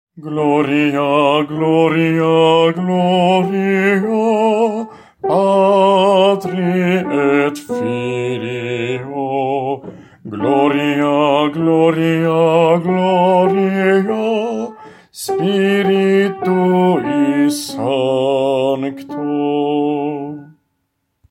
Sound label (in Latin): Gloria Patri ALT